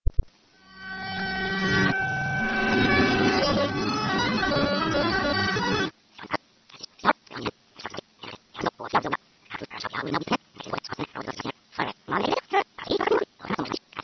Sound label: Rewind Mixture